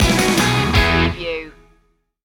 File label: driving fun stinger